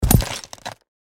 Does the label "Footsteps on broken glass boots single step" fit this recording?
Yes